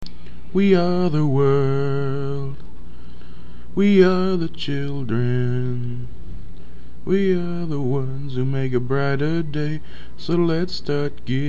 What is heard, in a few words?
Cafe Chorus